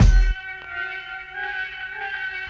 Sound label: Afro Chords Loop With Initial Kick 1 Bar 96 Bpm